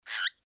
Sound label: cockatiel